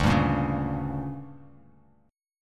D-Major 7th Suspended 4th Sharp 5th, Bass Standard; DM7sus4#5; strummed; JGuitar